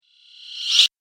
Shrill Whoosh; Whoosh Sound Effects

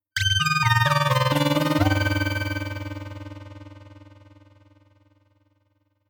Music Short Synth Logo Space Future Trailer Scifi; Sound Design; Sonic Logo; Tom Hutchings

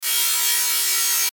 static digital

